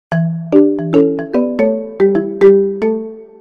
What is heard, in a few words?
MIDI; INOI 108R